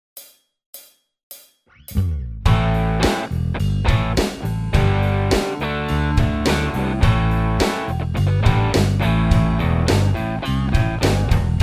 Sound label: groove